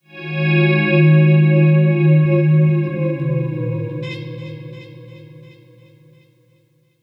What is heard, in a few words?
Building Hover